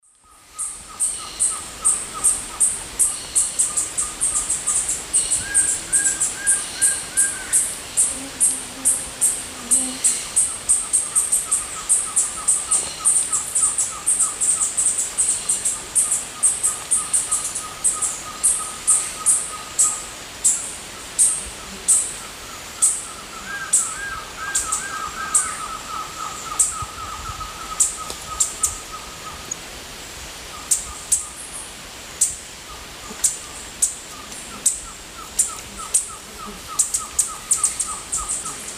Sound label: Tangara schrankii, song; S. America; Eastern Lowlands